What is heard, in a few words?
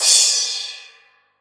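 cymbal, Drum & Bass 160 BPM; Ueberschall; Drum & Bass Flash Trackz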